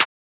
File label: Transient; FLPRO